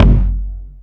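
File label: bass drum, left; Zero-G - Chemical Beats; BASSDRUM ZOO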